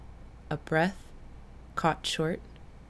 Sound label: BREATH caught short